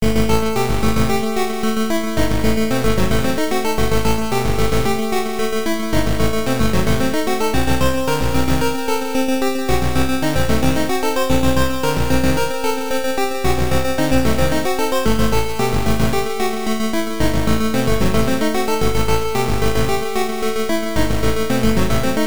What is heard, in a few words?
Dungeon; Lodoss Tou Senki 2; PC Engine Super CD-ROM2